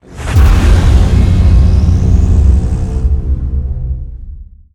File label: intro horn; smite; heimdallr